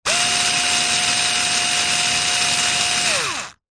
industrial power tool electric screwdriver med burst